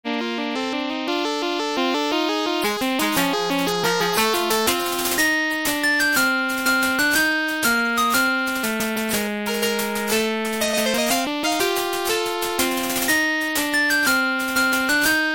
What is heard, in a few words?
Map Screen; Quack Shot; Genesis